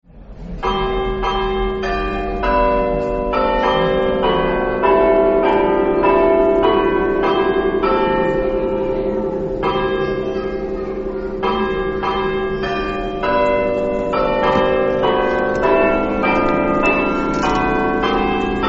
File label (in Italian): trieste, campane